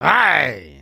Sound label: Get Hit Oneshot; creatures organic